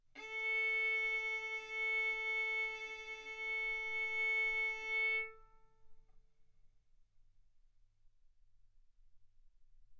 Violin arco mf sul A A4 B4 stereo

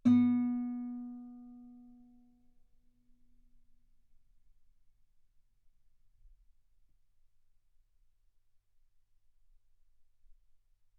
Guitar B3

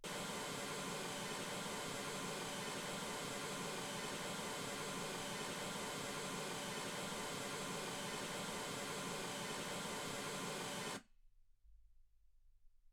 mls 48kHz

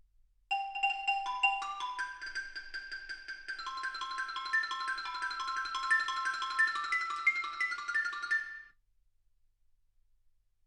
xylophone

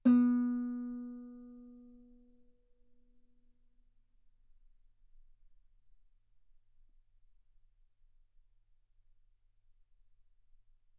Guitar ff B3; sul B

